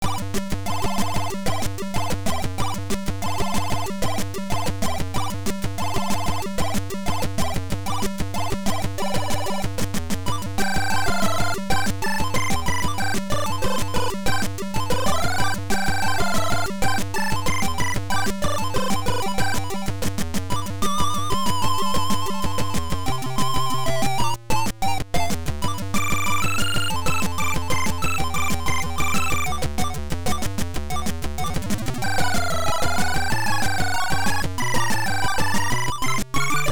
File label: Title AY Beeper; Chase HQ